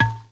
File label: kettle, Misc Percussion Ticks